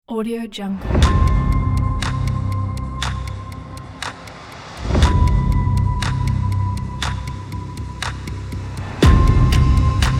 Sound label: clockwork cinematic trailer